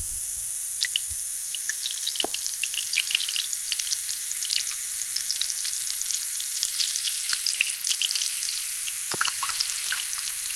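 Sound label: rice down on water cooking, boiling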